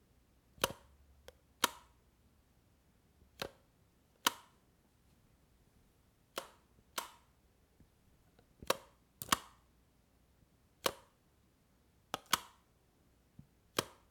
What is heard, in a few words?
Lightswitch mono